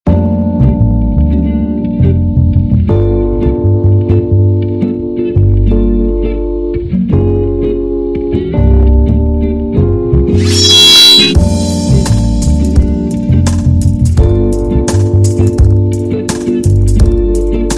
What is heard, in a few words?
Smooth Love Mix